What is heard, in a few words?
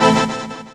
DR. LOOP; X-Static Goldmine 3; CHORDS